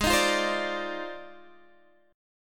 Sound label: F Minor 13th, guitar chord